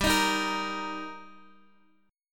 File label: G Minor Sharp 5th G Guitar DADGAD, chord